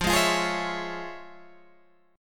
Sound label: F Minor Major 7th Sharp 5th Guitar Open G, chord; 15,13,15,13,14,14